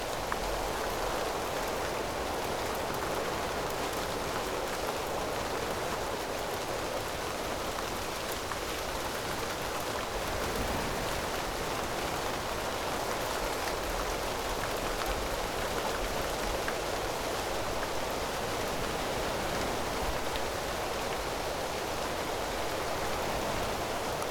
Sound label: ambience - rain